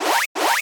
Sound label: BALL OUT; pat & mat game; MINIGAMES; MINIGOLF